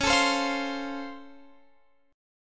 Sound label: C sharp Augmented Major 7th, C sharp, down